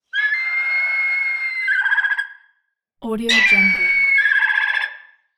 eagle call hoarse

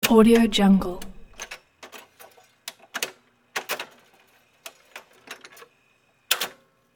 changing record on jukebox

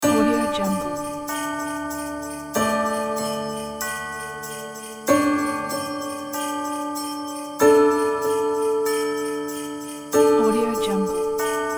christmas spirit background

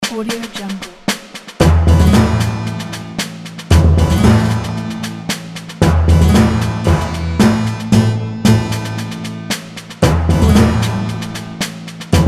funny cartoon pirate theme

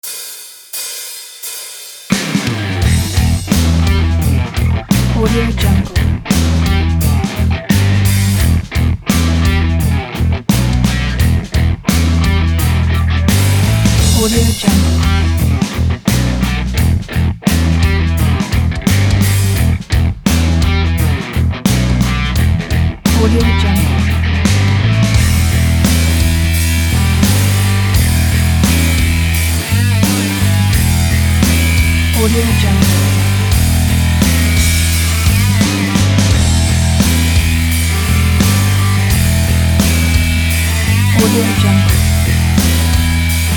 brutal blues

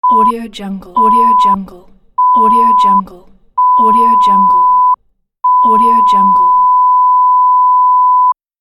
censor beep

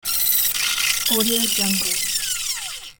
retro broken machine

